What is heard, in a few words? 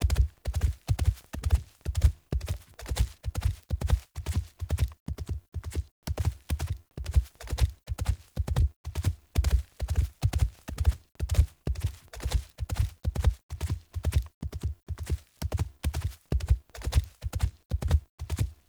Wolf Steps Run Loop Default; Animals